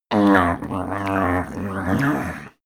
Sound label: male eat feral; koerpergeraeusche; menschen; soundpool